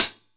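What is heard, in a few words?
MIX closed hi-hat, 14 INCH OLD HI HAT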